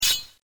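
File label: knife draw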